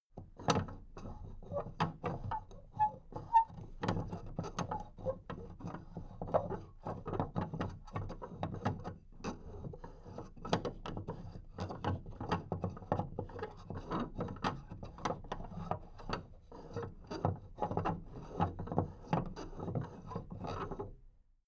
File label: big plastic case movement handle